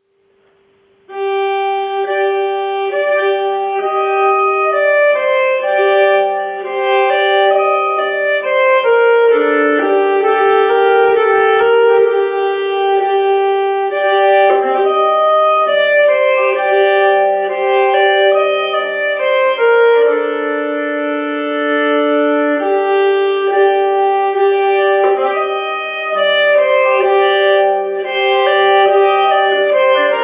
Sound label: Menuett lent, nyckelharpa